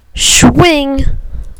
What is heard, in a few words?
shwing